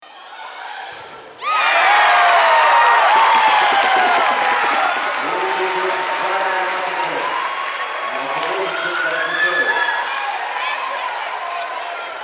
voleybol

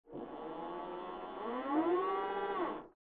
motor zooma

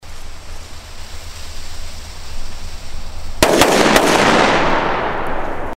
petarda

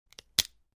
zvuk pereloma kostey

